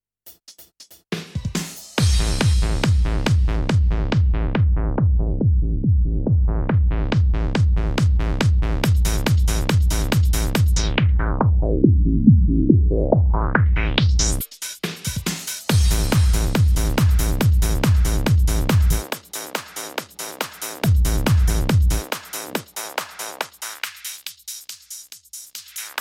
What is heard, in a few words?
Filter Handsup